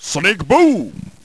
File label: sonic boom